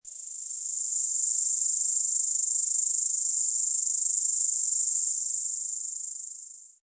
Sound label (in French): serpent; MOBICLIC148